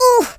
oof; Russki

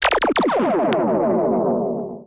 HighScore

